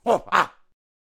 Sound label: Monkey King Attack Vocals